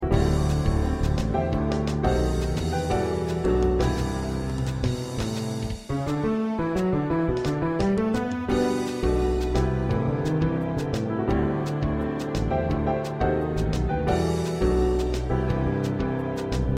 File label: Accompaniment